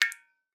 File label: Drips